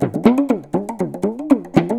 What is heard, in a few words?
PAPAN, Indian Traditions